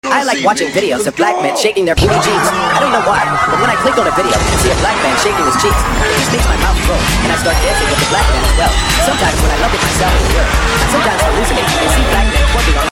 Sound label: car